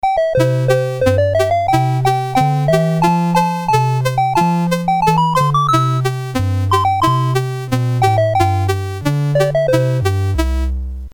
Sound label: Dixie, CSG8580R5; Jim Butterfield